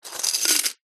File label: foley money coins in metal money box movement; zapsplat